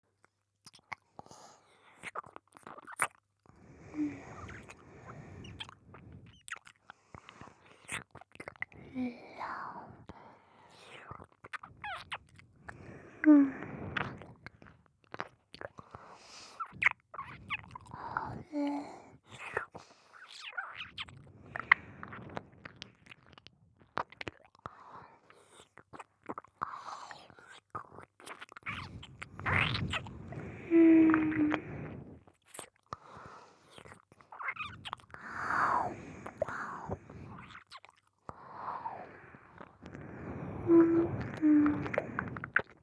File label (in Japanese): 耳舐め 普通, ASMR; 淫乱メイド